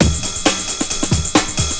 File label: loop; SoundFactory; SMPMANIA